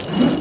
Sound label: Ball And Chain Whirl